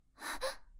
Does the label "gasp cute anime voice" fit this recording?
Yes